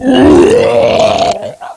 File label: Bloat Barf Attack; Bloat Santa